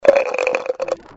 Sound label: dice; GS390 Prime